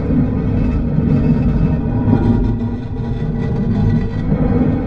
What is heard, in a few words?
stone sliding; openspace3d; aliencrash